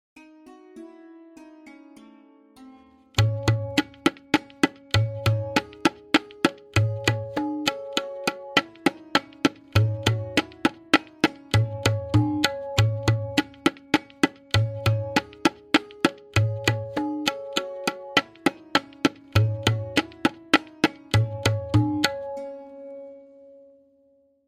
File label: Variation 3-Played with Lehra, Benares Kaida 1, Tintal; tabla